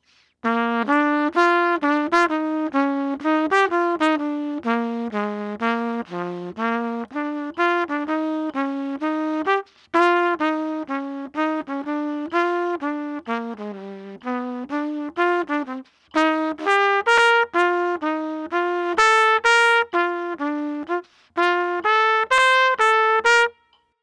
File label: bassline